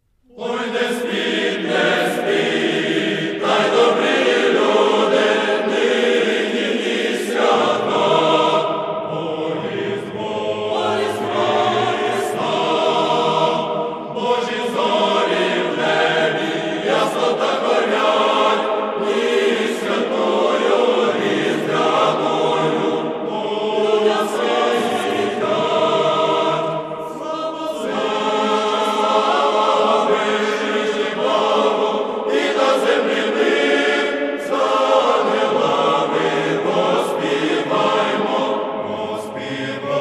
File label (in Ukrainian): oj ne spit ne spit